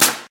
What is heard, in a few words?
Casket nail